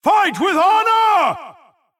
MobVoice Black Knight Attack

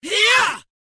Mob Voice Attack; Bad Brawler

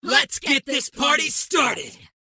Mob Voice Regen; Bad Brawler

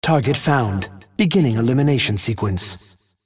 Mob Voice Rampant Cyborg Regen

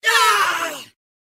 Mob Voice Attack; Bad Brawler

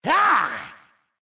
attack; Mad Mage; Mob Voice

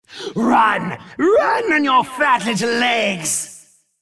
Mob Voice Mad Mage Regen

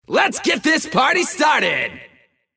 regen; Bad Brawler; Mob Voice

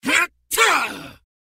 Attack, hunter; Vicious Hunter; Mob Voice

